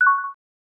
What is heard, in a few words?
decision, button